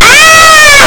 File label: aargh; alex kidd the lost stars